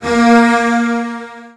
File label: A#2, Virtuoso Strings, 16TH; ILIO; Kirk Hunter; CL 16TH